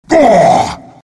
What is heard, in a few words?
pain; doom bringer